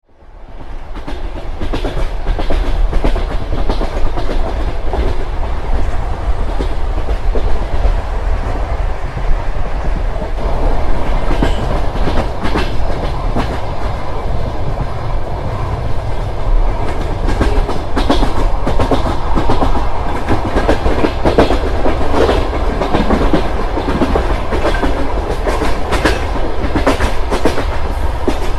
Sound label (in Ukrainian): stukit kolis potyaga kyyiv herson